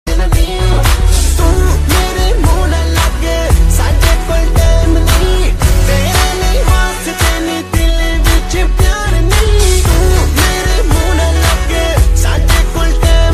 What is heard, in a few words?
ringtone, punjabi; bollywood ringtones